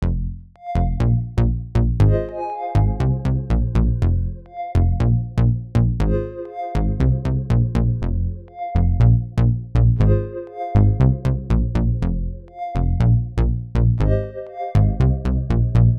Chorus pad with bassline

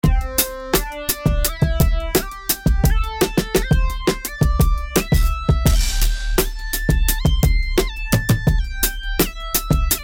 major and minor pentatonic

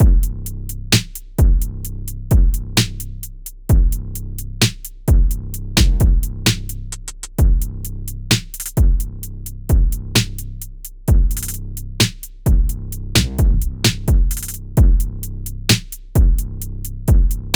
Popular Drums 8 16 bar

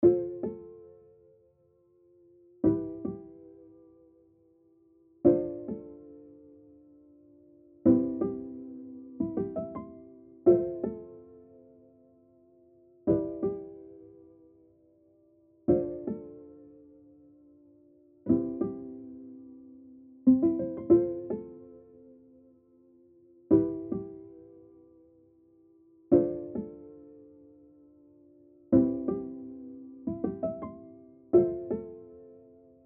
main piano part; zenology

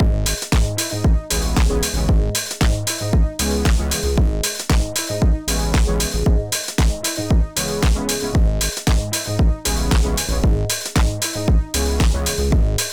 Full Chorus Instruments

